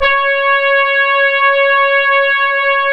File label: C#, Synclav FM, BRASS; Big Fish Audio